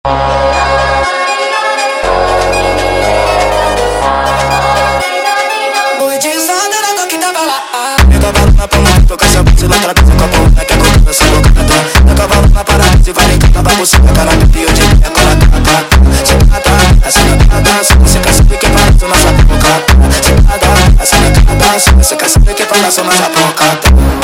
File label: Funk Risada sped Up